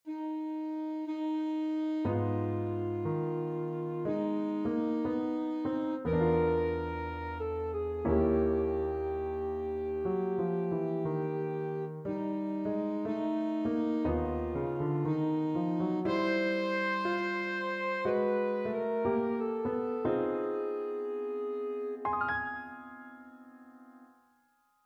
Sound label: happy jazzy birthday, saxophone